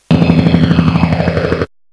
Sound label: Drum Roll